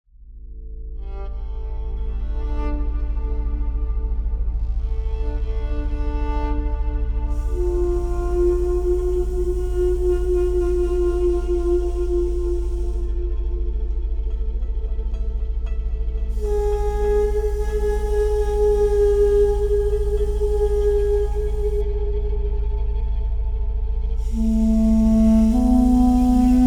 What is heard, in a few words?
cinematic drones